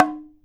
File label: OKAWA, JAPAN PERC; AKAI S6000 CD-ROM; WorldSounds